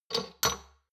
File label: shot glasses pull out medium, gastronomie; berufe; soundpool